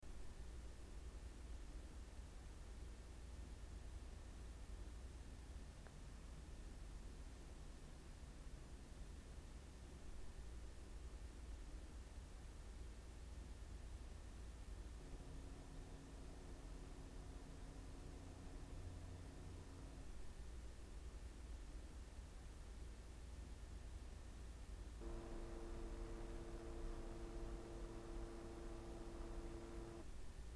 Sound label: scythe infinity 1m